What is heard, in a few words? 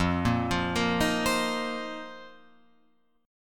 F 7th Suspended 4th F down Guitar Open F; arpeggiated; JGuitar